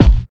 short steel snare drum G sharp key, steel snare drum tuned to the G# note